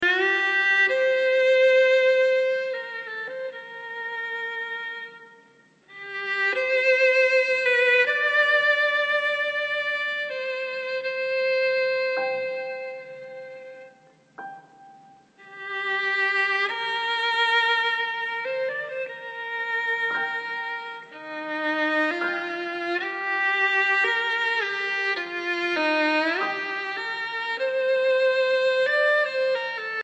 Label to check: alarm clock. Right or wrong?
Wrong